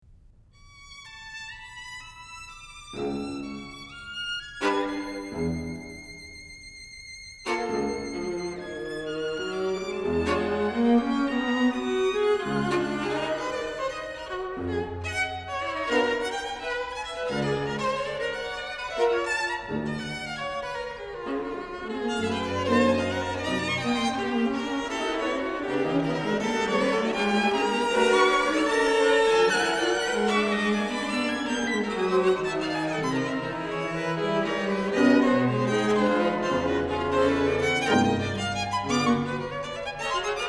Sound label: string quartet